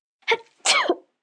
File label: Sneeze Hi Choo, Sneeze, 1 child, classic, human voice, kid; Sneezing